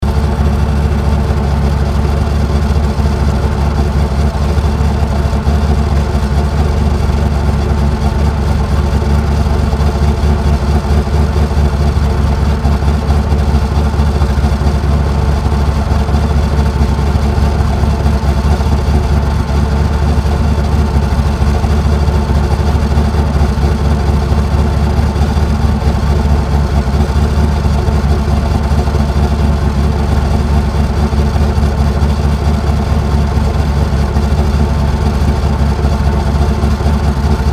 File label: engine chevy c5r 7ltr idle engine close